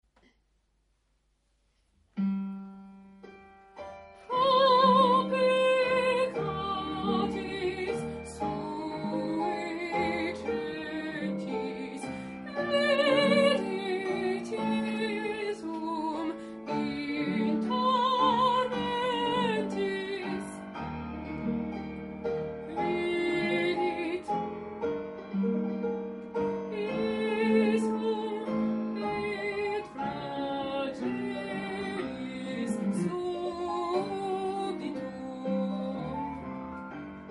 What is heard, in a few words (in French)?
basse; stabat mater